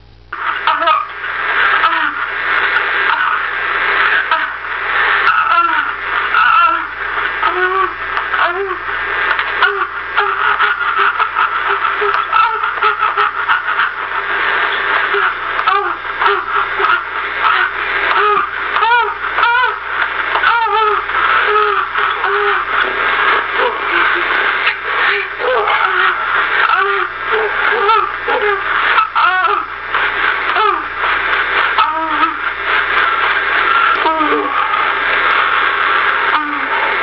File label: grainy noisy orgasm, Silly Lo-Fi